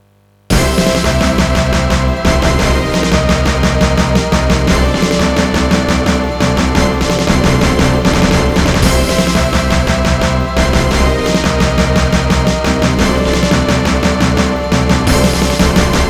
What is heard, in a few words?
ym2151 mt32; gradius ii